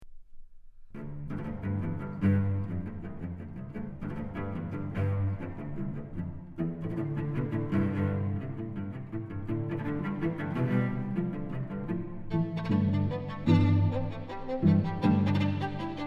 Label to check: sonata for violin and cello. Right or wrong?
Right